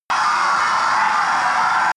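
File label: scrape wind reverb, science fiction; sciene-fiction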